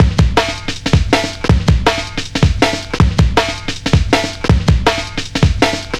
160, Drum Loops; Total Drum&Bass

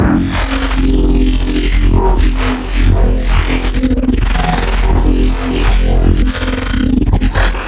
F-E LFO Reese Bass; neuro; BIG5AUDIO

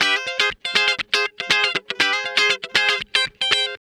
Guitar Licks

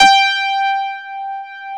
piano G5, 2 Step; USB Soundscan vol.51; House Side Of 2 Step; AKAI; PIANOS

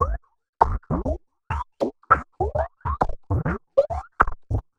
drloop, drumloops, Vinyl Attack; Masterbits

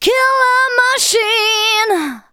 VOCALS SUNG; Techno Trance Essentials

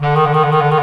THE CLARINET

